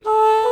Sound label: alto flute ah melody morph